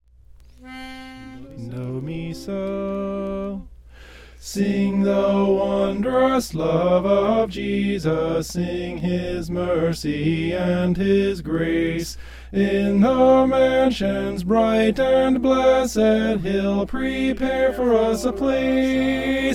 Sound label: sing the wondrous love soprano